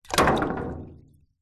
water tank wood batton drop into full small water tank water splash; industrial; metal impact; zapsplat